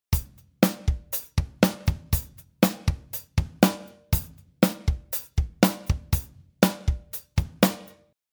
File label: Bass